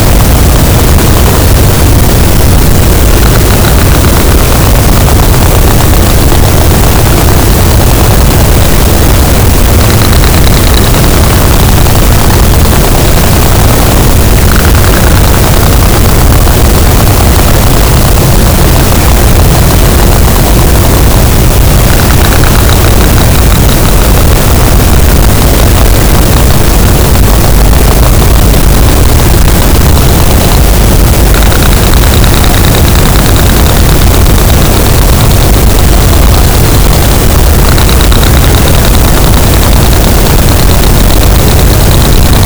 cachalot, gaussian noise; Physeter Macrocephalus

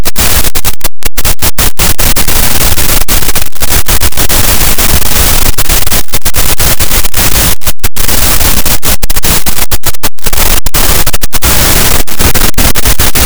cachalot, Physeter Macrocephalus, gaussian noise

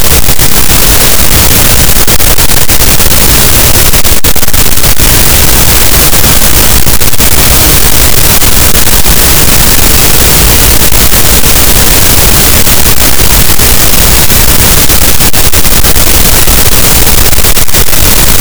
cachalot, gaussian noise; Physeter Macrocephalus

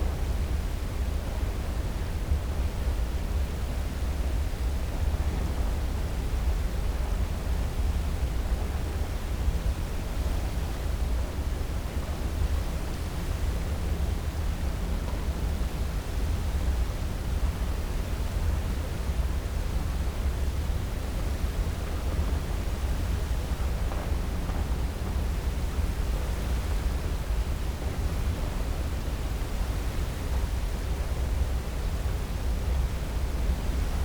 cachalot negatif, Physeter Macrocephalus

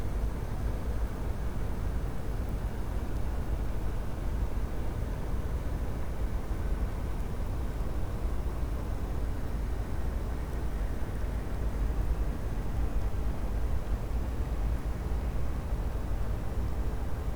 cachalot negatif, Physeter Macrocephalus

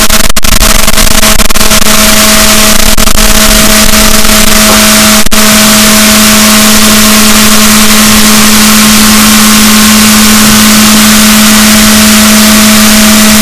rorquals negatif, Balaenoptera Physalus; boat; SNR -6dB

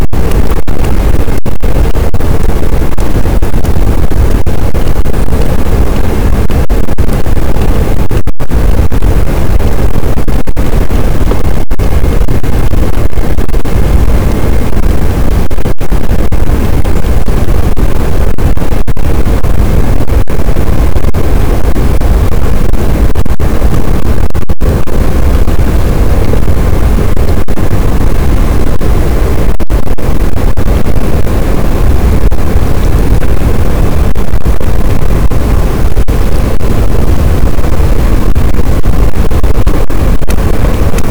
cachalot positif, boat, Physeter Macrocephalus

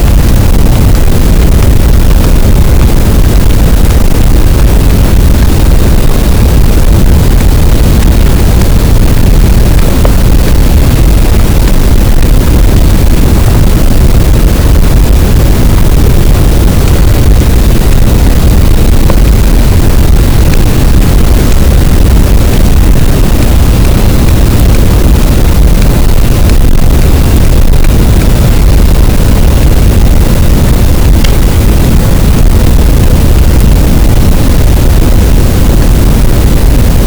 cachalot, Physeter Macrocephalus, gaussian noise

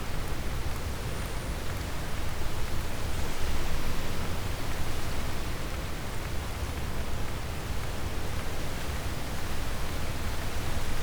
cachalot positif, Physeter Macrocephalus

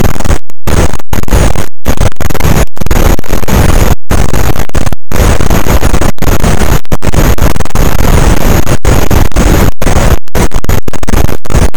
cachalot positif, boat; Physeter Macrocephalus

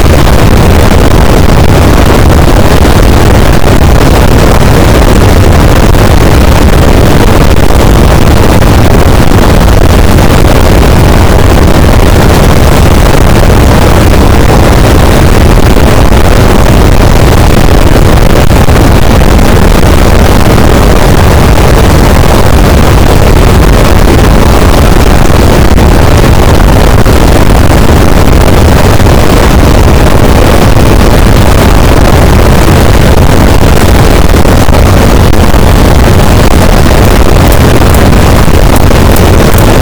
cachalot positif, boat; Physeter Macrocephalus

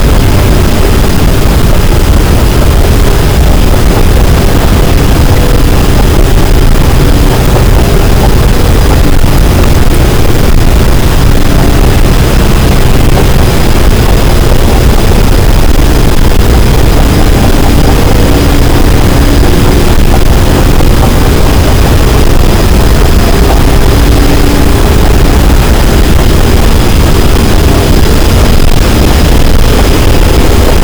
cachalot, gaussian noise; Physeter Macrocephalus

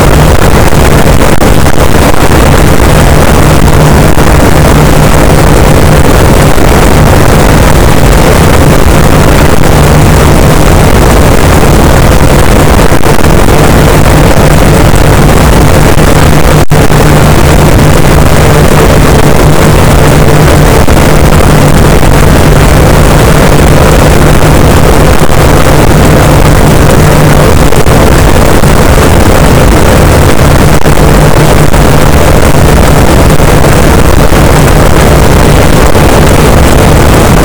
cachalot negatif, boat, Physeter Macrocephalus

